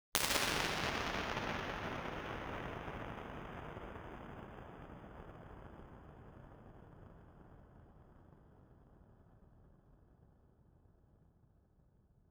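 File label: stereo, impulse response, St Marys abbey reconstruction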